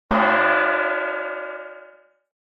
Metal Pan Ping Hit - Large Baking Tin; Hollywood Edge; sound designer's toolkit v2

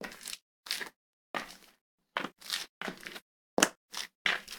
sticky surface, footsteps; NS Studios - ultra sound collection

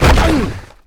Football Hit Tackle

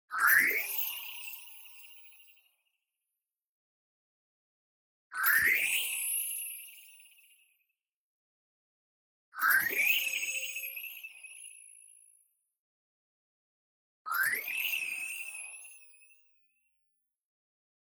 tonal, flutter sparkle; boom library; Anime Essentials

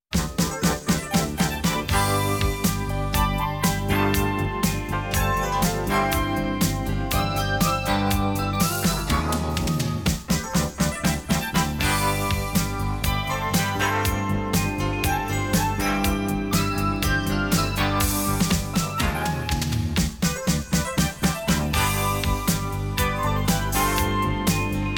Medium Tempo 'easy Rockin'', music

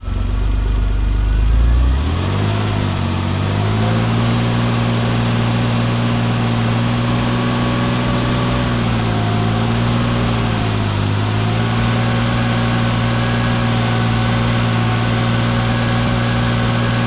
Porsche 911T Fast Idle, Automobiles

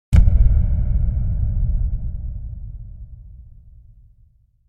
Impact Drum Dull Low Reverberant Hit; Hollywood Edge; sound designer's toolkit v2

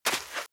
Footsteps Sand Dry Run Left; RadwinIsland

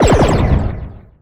Pickup Scifi; SFX Kit

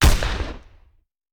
Hand Gun; Sound Ideas; Guns

